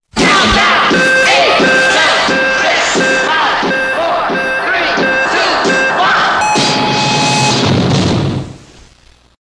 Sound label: Top of Hour Countdown; WQAM